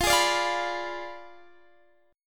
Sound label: F Diminished 7th F down Guitar Drop D